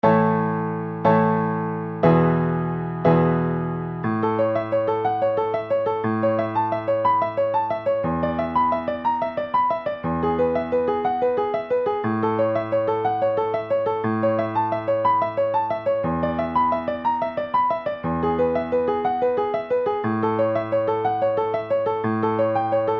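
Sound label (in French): preludes piano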